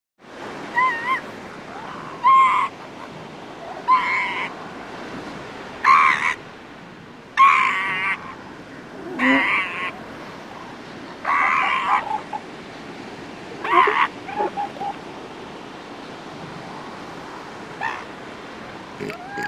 Seal, Elephant Call, Cry. Cub Growls, Snorts Multiple Times With Ocean And Seagulls In Background. Close Perspective.; seals & sea lions